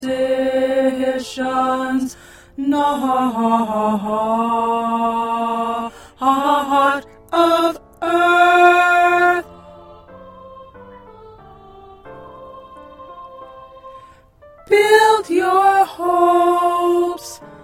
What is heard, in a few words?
Tenor